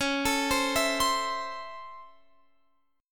C sharp Minor Major 7th Sharp 5th C sharp Guitar Open F; C#mM7#5 arpeggiated; x,20,21,19,19,19; JGuitar